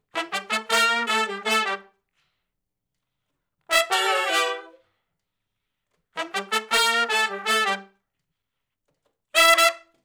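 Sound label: R&B Riff (Emin) unison to harmony; Sections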